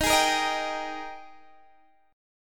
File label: G sharp Major 7th Suspended 2nd